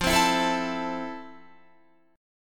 F Major 7th F, Guitar; strummed

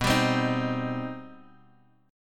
C Minor B; strummed; JGuitar

